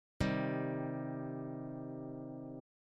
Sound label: c minor triad